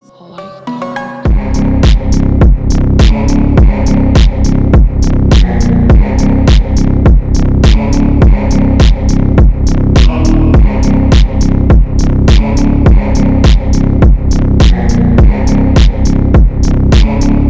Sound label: rave slowed